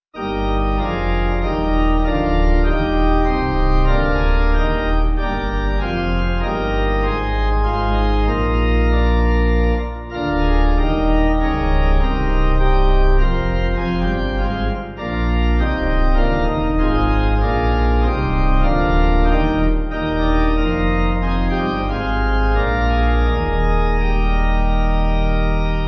Pipe

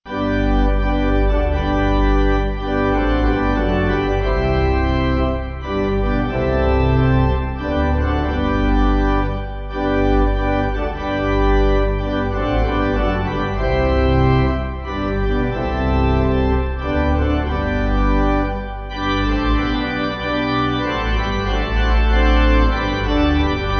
Pipe, Pipe Organ, Backing Files for Churches

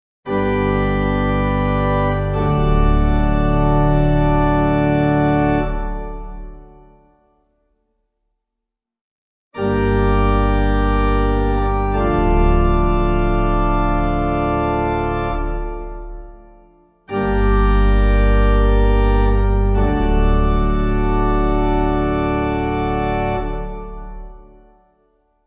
Amens Simple D Pipe

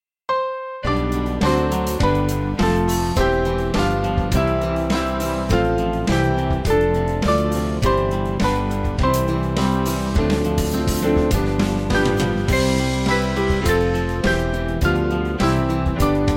Everyone Extol Our Lord, Band; Trallwm

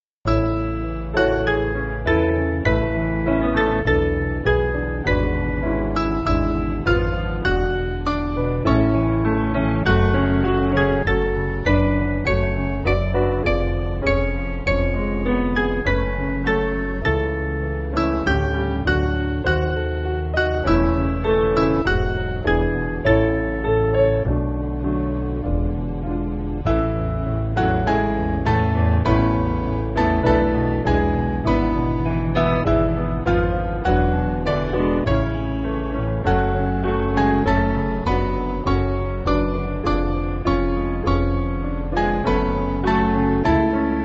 Piano, Music Backing; Mainly Piano (CM)

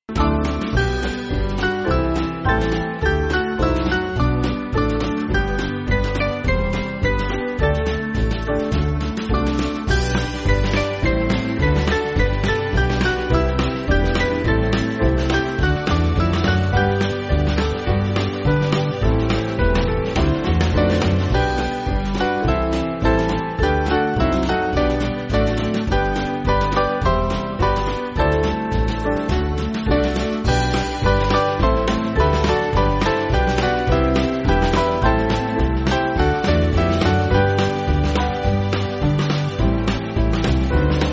Band, church music

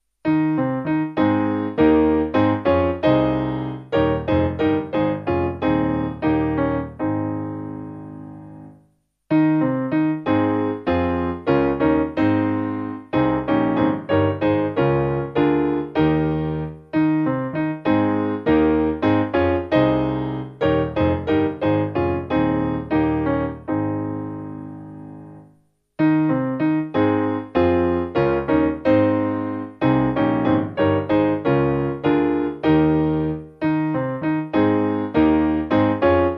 The Lord Is King Piano

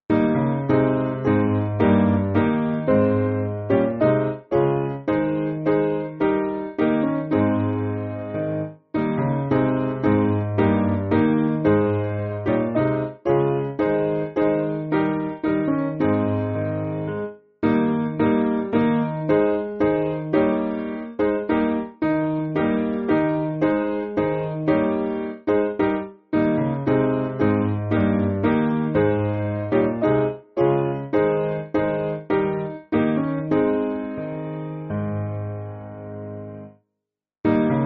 Piano, Music Backing Files for Churches; Bechler

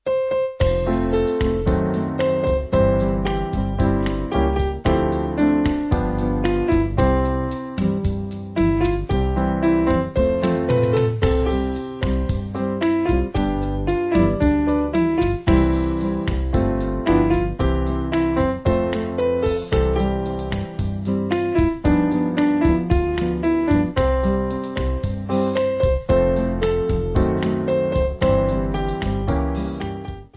Jazz Piano

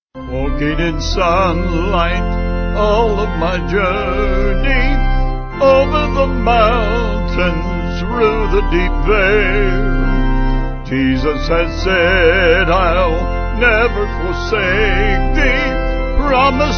Vocals Organ

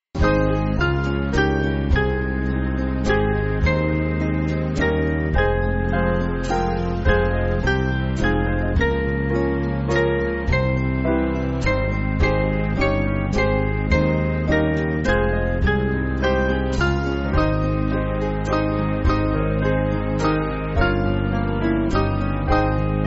Band, Backing Files for Churches; Father Of Mercies; Abergele

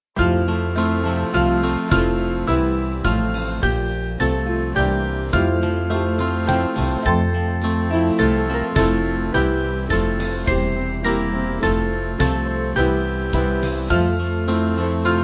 Band; Holy God We Praise; Grosser Gott

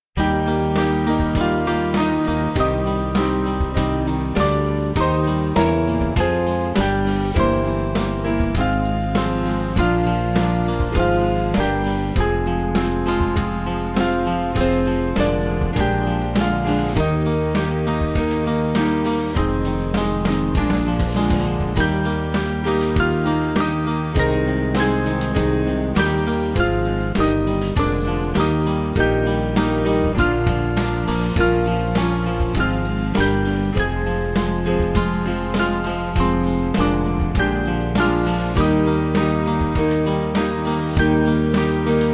The Birds In The Forest, Band; Mendelssohn

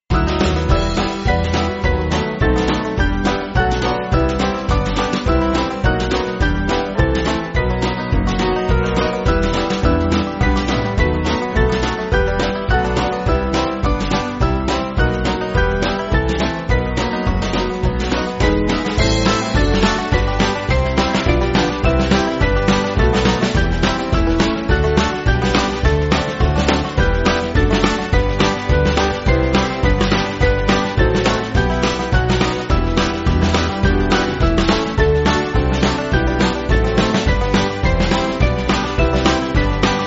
God Rest You Merry, Carol, Band

